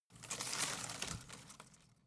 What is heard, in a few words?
home plant leaves rustle regular